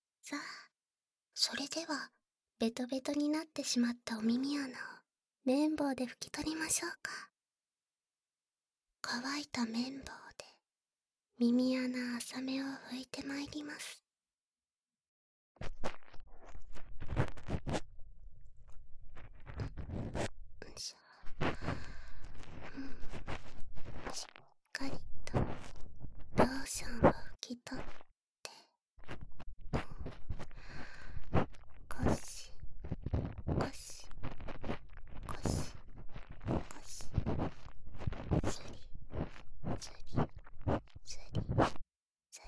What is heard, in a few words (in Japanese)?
【左】綿棒, 耳かき, ASMR